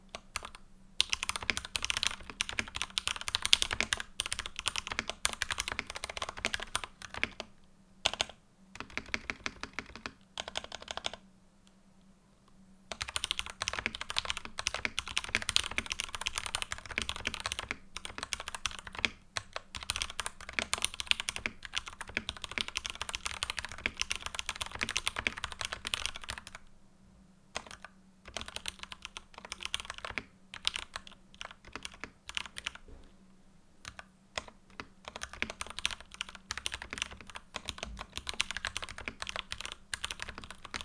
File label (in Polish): test audio klawa; genesis thor 660 g2